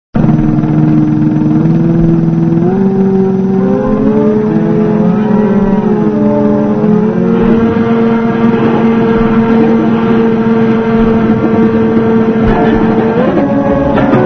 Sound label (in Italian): Risveglio di una città